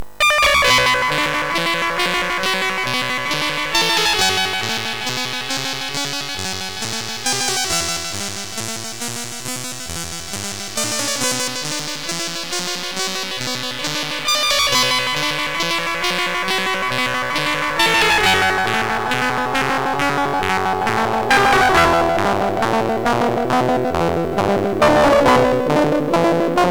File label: Hocus Focus, MOS6581R4